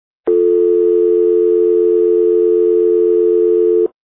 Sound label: dial tone